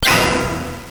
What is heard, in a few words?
no more chest; loderunner